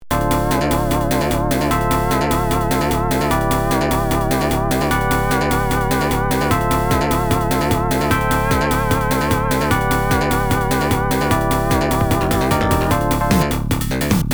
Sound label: unrelenting battle yamaha opl3